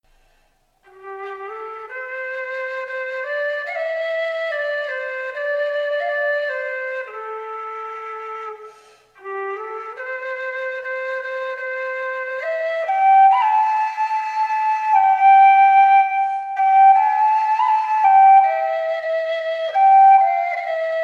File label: low g whistle